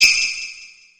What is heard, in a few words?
bell, electronic instrument